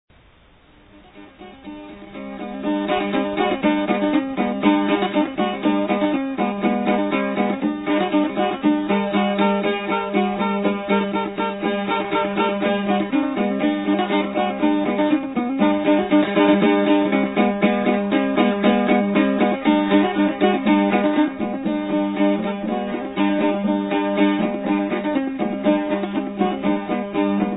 Instrumental Music, Lao Folkdance Khen (Bamboo Flute), Kong Tum (Tum Drum), So-u, Ka Chap Pi; Champasak/2000